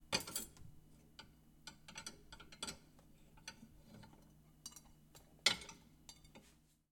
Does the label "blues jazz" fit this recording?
No